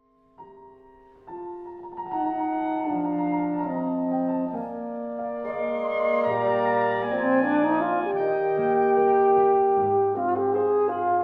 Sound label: mozart quintet